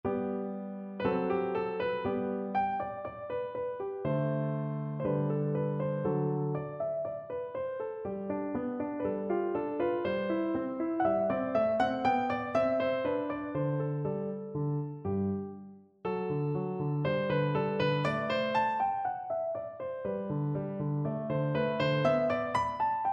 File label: sonatina g